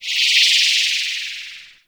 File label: Mushroom Interrupted; Nokko; Warframe; Nokko Colony; Mushroom Hunt